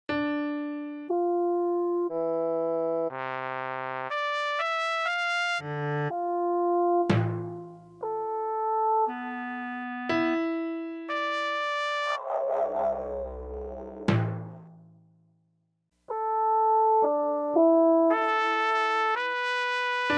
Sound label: melody